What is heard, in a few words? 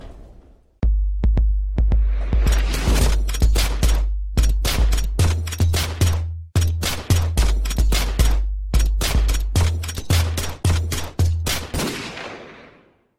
Gun Sound